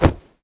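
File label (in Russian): мощный удар по воздуху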